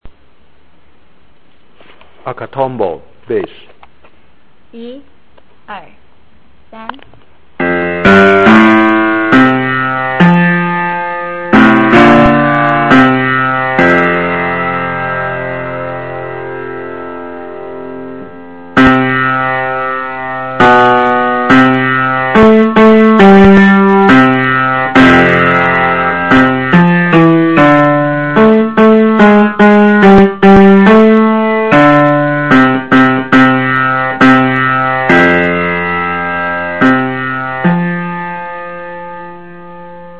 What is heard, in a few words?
Bass; Akatombo